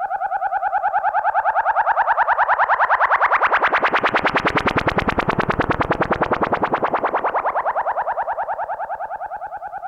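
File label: oscillator DECAY, SYNTH FX; AMG - Vince Clarke Lucky Bastard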